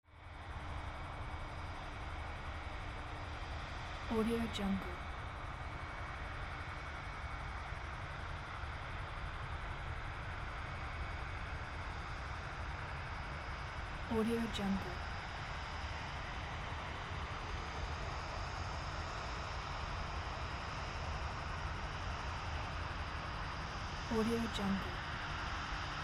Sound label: distant airport night ambience; urban sounds